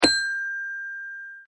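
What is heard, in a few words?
xylophone; Toy Story 3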